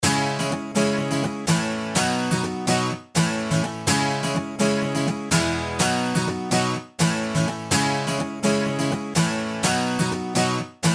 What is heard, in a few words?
Corporate Upbeat Uplifting Background